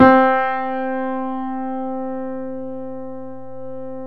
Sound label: PIA GRND, Roland